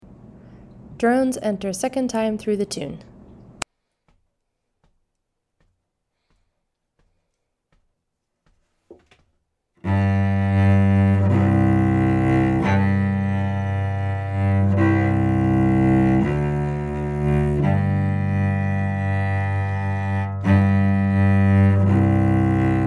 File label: Drones only; La Promeneuse